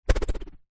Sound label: foley stick plastic swipe swing through air whip wobble; zapsplat